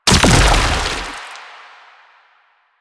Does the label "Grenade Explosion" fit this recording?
Yes